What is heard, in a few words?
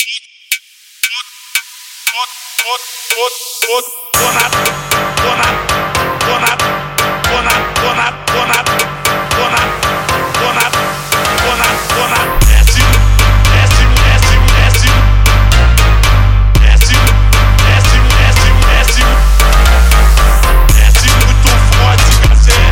Phonk Music; فانک phonk